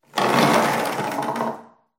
ambient sanctuary wood; alyx sanctuary wood

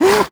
grunt short, zombie vox; creatures

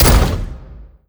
step approach, dog; npc; scripted

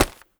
impact, paper newspaper; physics; props

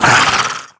attack warning, headcrab, creatures; headcrab classic; vox

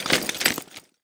suit, footsteps

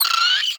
vox hungry growl, creatures, snark

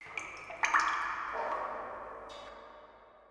ambient water drips; Portal 2

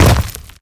land, footsteps player debris

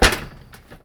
metal grate, footsteps; player

